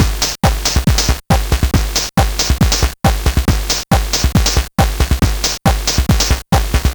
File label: LSDJ 4 to the dirty Floor 138BpM, Nintendo Gameboy Loops; maurice.rebell Loops